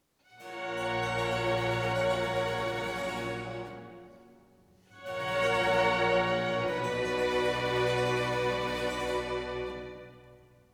Concerto for trumpet, 2 oboes, strings & continuo in D major; Grave; TWV 53: D2; Music for Trumpet